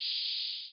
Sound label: Air, Train; OpenBVE